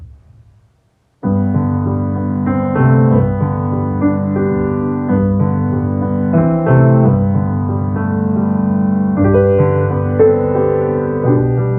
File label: Softly & Tenderly piano harder